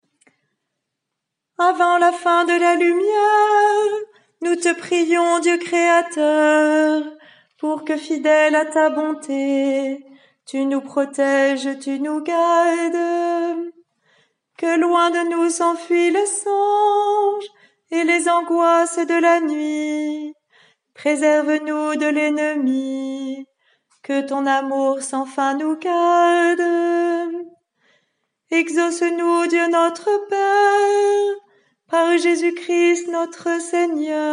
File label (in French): Hymne